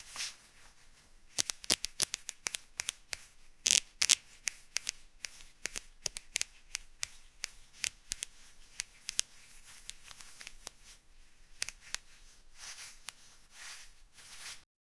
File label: the creak of latex gloves; laboratory